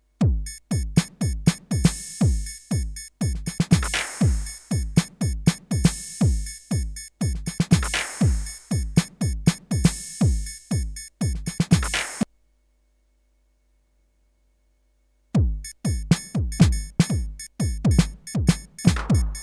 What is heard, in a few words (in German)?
Microtiming und Polyrythm